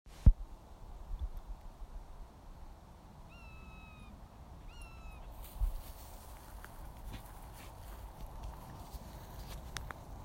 Buzzard

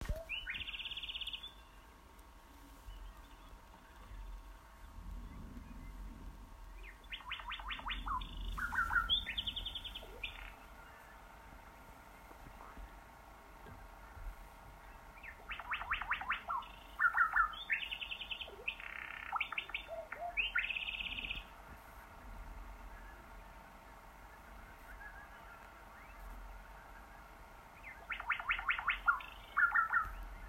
bird song; Loch of the Lowes

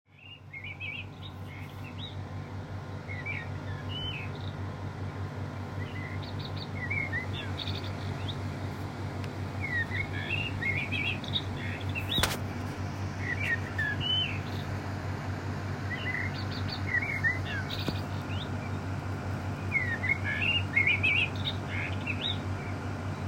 Night Bird

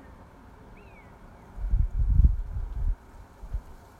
Buzzard over the garden

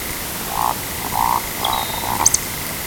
Woodcock call

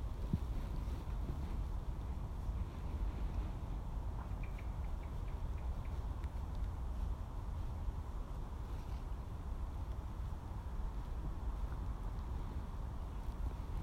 Blackbird; Robinswood Hill

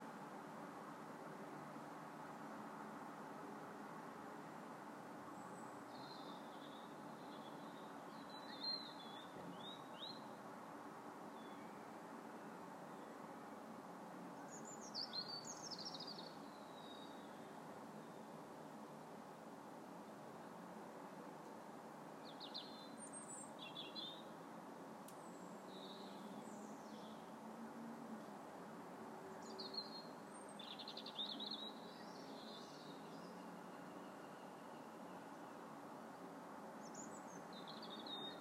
Robin call

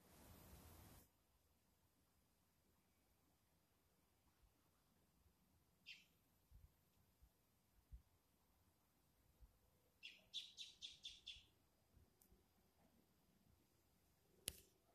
Unknown bird call

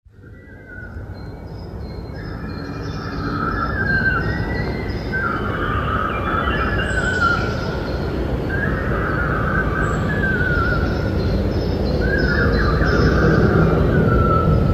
Weird noise

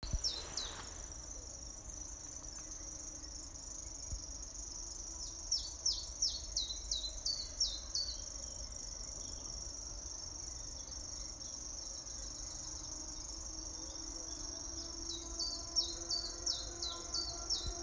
bird song

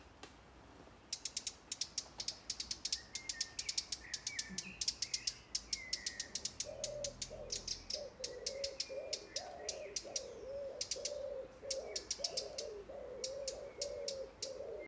Bird song

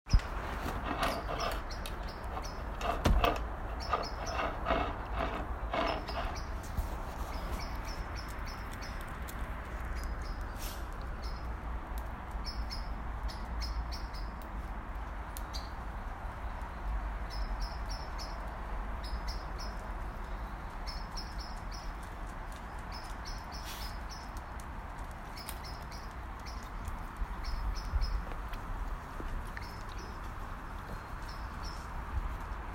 Blackbird

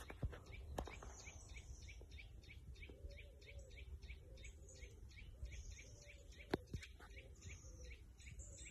Unidentified Bird Call

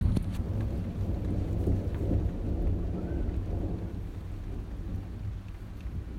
Thunder

